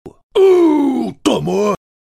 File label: uuuhhhh